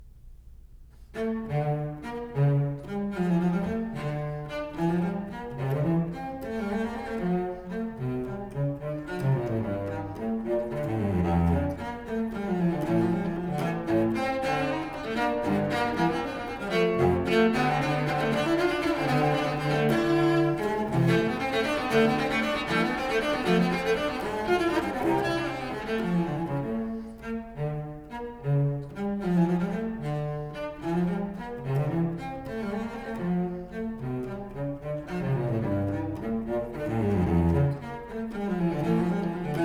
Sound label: Solo Cello; D minor; Gigue; BACH Suite for Solo Cello No. 2; BWV 1008